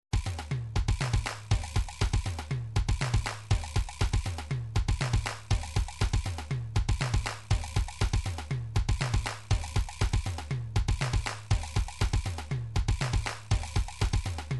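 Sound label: drums pattern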